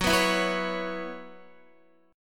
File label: Gb 7th Suspended 4th Gb down Guitar, chord